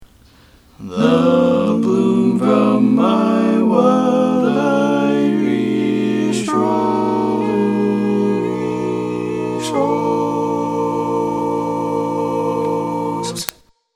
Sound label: All Parts, barbershop